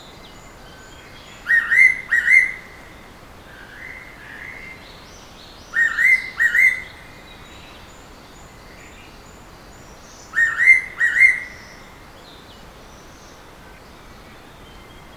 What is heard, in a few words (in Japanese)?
bird